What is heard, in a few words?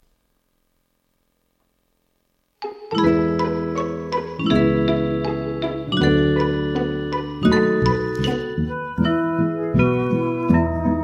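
backing track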